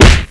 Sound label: baseball bat strike